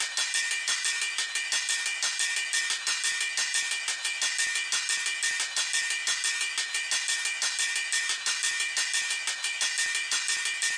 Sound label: rides; On A Roll